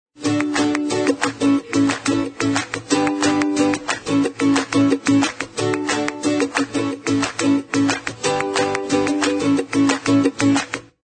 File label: Happy Kids Music Loop